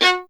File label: STR VLN, Solo Instruments; Miroslav Vitous